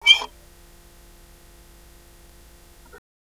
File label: Violin Extended Technique